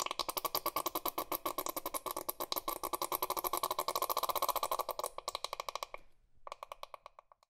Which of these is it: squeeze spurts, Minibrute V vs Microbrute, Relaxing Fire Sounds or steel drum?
squeeze spurts